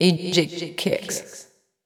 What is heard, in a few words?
VOICE GRL HOUSE 125 A, House Voice